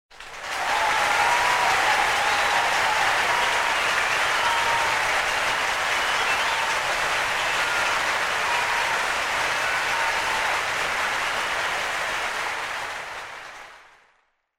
Cheer Crowd Low Long

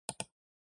PC Mouse R

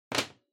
Power Strip Noise Cable

